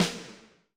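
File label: Snare, Alesis SR16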